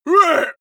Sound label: male shout hit heavy